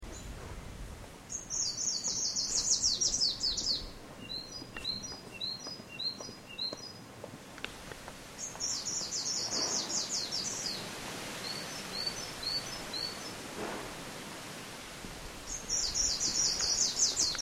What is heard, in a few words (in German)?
gruenlaubsaenger